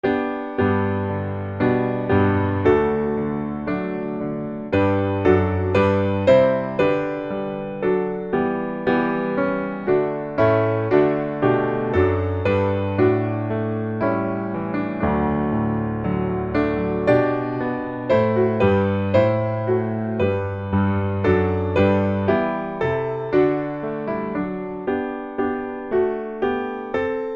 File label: piano